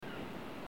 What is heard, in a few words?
evp dorm hallway short; museum